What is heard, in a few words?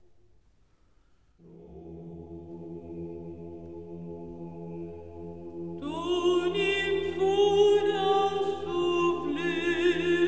Greek Chant Fourth Century